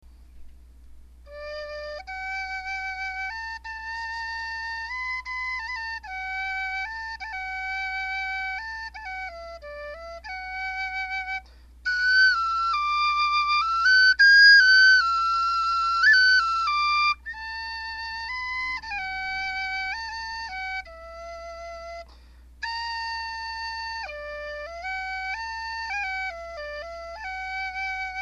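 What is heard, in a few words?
high d, brass whistle; jubilee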